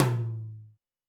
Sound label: Tom Hi D Amb, Alesis D4